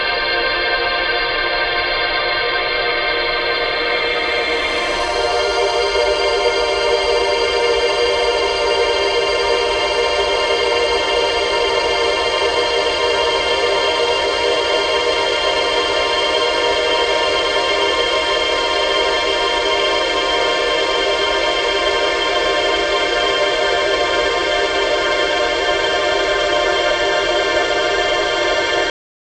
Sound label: pad Gm; lostair